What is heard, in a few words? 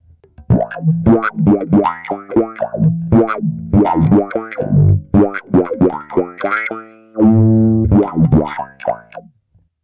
Waldorf 2 Pole Bass Env Follower HP Drive